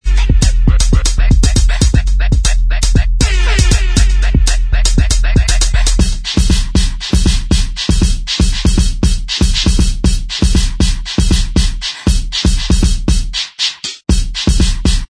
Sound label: Bvox